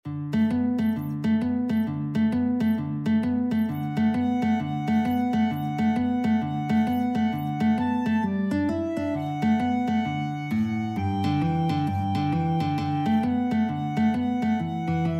jingle bells boogie, guitar clarinet duet

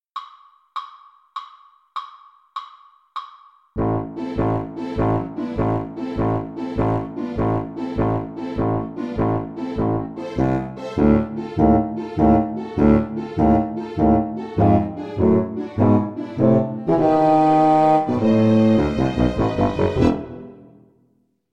sorcerer apprentice, Oboe

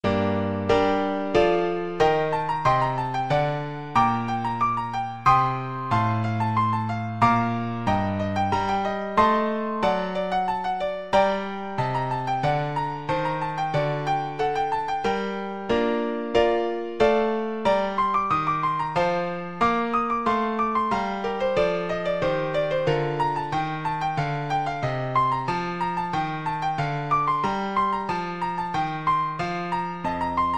rondeau, piano

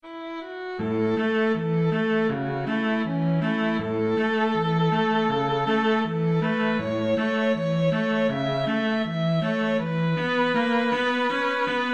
violin cello duet

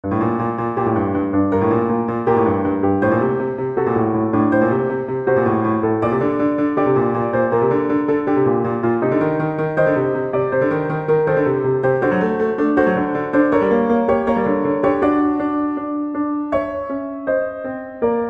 PNO

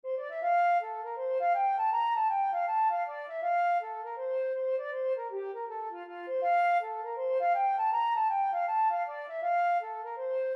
flute

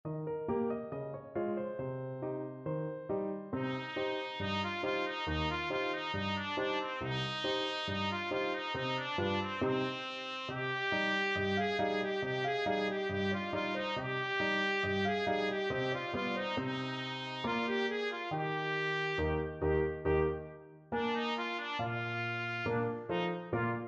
tanz, trumpet; patch tanz

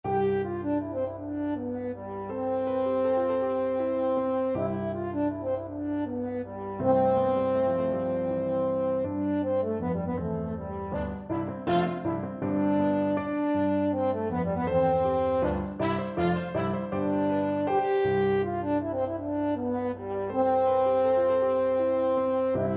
french horn

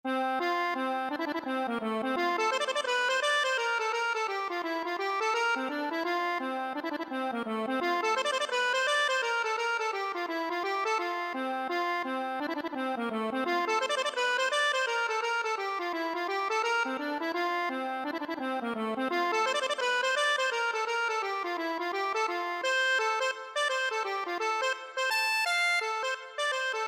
everybodys fancy, accordion

